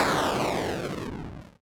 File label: boom